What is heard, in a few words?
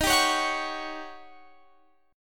D sharp 7th Sharp 9th D sharp Guitar Open F; D#7#9; strummed; x,22,19,20,21,20; chord shape